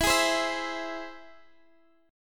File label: E Augmented, Guitar, DADGAD